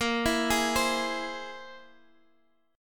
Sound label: C Augmented 7th Bb